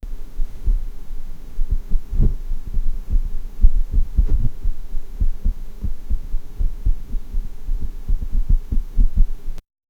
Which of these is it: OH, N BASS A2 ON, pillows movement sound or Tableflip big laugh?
pillows movement sound